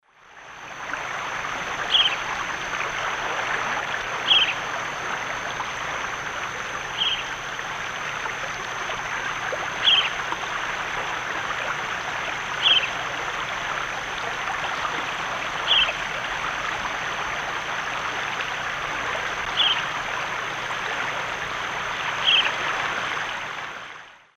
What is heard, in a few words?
Megarynchus pitangua, dawn song; S. America; Eastern Lowlands